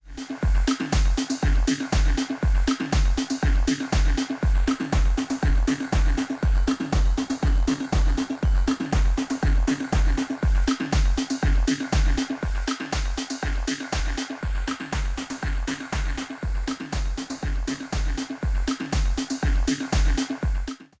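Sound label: Reloop RMX 90 DVS Bit Crusher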